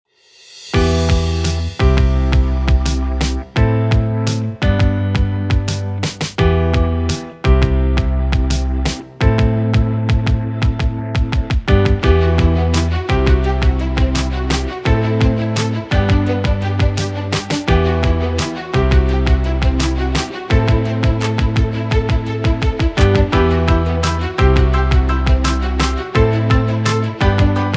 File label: green groove